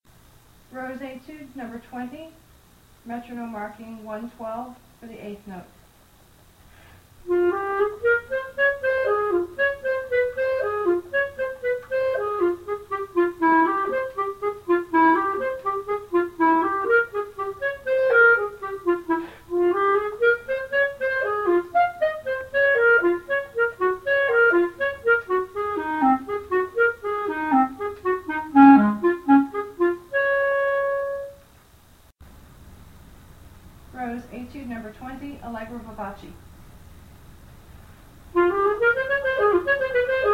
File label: Clarinet slow then fast